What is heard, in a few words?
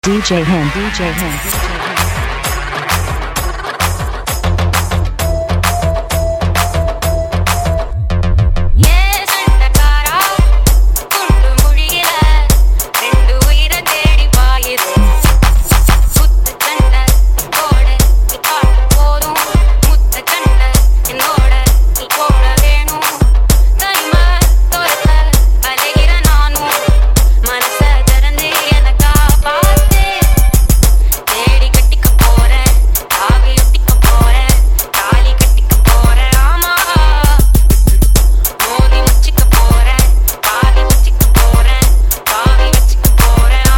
Tamil 2016 Remix Songs